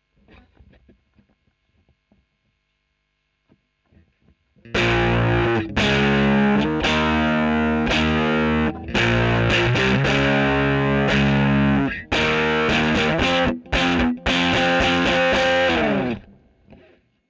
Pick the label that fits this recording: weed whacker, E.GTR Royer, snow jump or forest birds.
E.GTR Royer